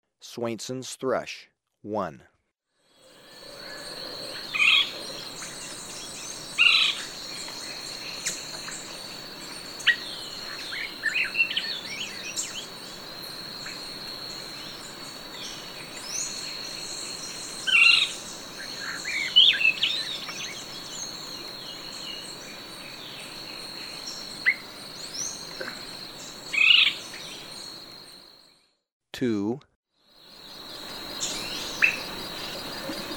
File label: Swainson's Thrush, Birds of Ecuador; S. America